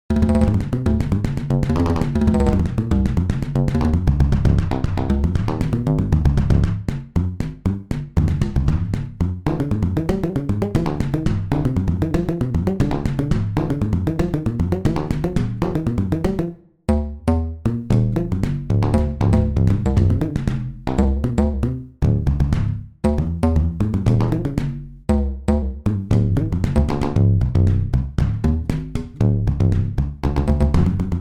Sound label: World Percussion